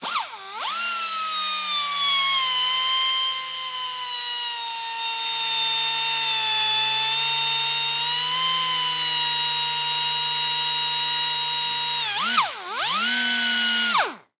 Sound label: Industry Sander Electric Grind Metal Surface; Frogsong